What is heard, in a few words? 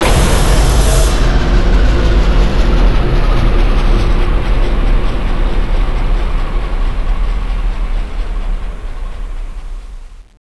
ship down; cstrike; kif metro